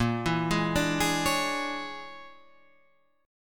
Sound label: A sharp 7th Sharp 9th Flat 5th A sharp down Guitar Standard; A#7#9b5 arpeggiated; JGuitar